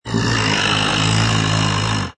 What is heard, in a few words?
Creature Growl, deep throaty growl, snarl; Monster creature or fantasy animal; sneer; Threatening; Horror